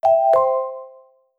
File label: startup; chromeos